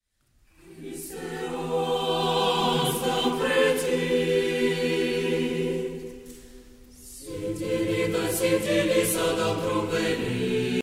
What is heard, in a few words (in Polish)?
Gruzinskij raspiew Chór; Christos woskriesie; Chór Uczelni Muzycznej; Nikołajew; Ukraina